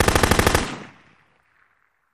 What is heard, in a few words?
Machine Gun Single Rapid Fire Burst